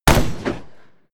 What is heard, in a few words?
revolver close shot; schusswaffen